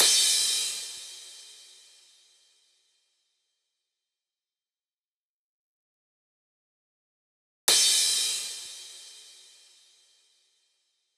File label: Crash, Future House 125 BPM F; Big EDM; Future House Tools; Construction Kits; Pump